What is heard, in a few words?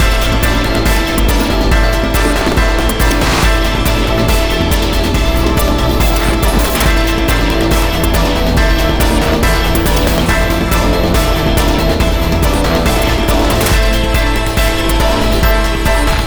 Trainride Stationary Fast; rive wreck hack die retry; ps4